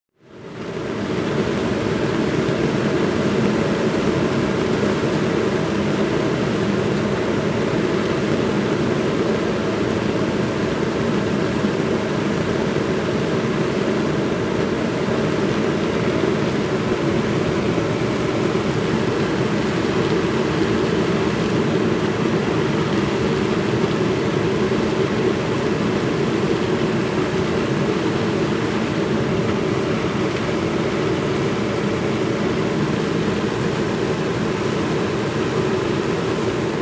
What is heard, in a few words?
Heavy Engine Noise